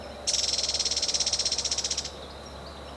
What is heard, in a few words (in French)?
bouscarle de cetti